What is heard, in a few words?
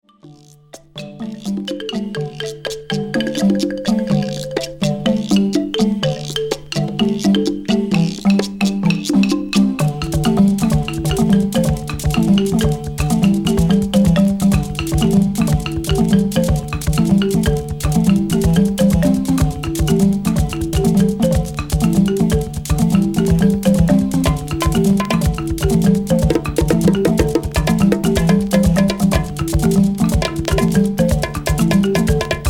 angklung new percussion